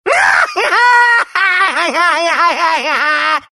laugh; meepo

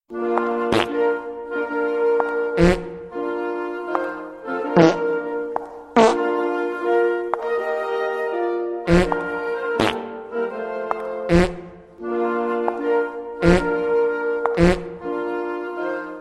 bride farts; here comes bride

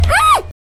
rage noise; jerma

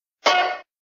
cartoon clank

